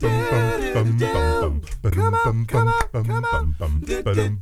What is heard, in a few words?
Accapella, Doo Wopper, Blues & Soul